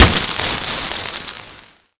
projectile impact flesh; pomson

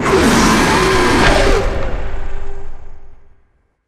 jumpscare; critters; nightmare criters

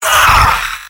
pain sharp; tf2; garrysmod; mvm

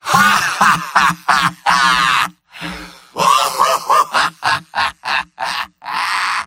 medic laugh long; vo; tf2; mvm

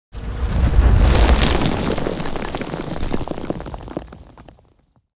debris; tf2

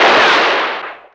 western shoot; taunt; Garry's Mod; tf2

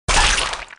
gore; kolmods proj impact

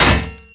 cleaver hit world; tf2

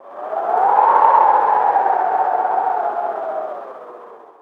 windgust, ambient; Garry's Mod; tf2

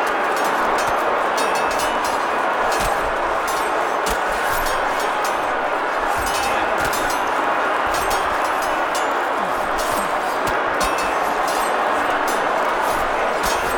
medieval outside, ambient; Garry's Mod; tf2

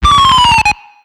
death; Garry's Mod; tf2 content

